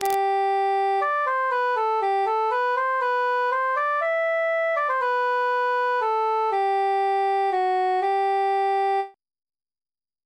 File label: nightingale altus solo